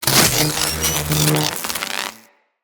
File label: Electro Prod Equip; Weapons